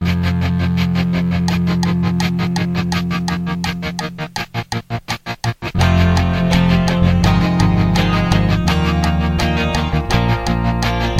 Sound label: drums backing track